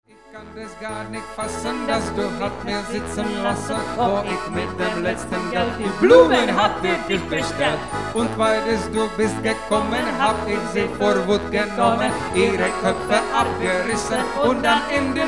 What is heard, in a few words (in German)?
Polka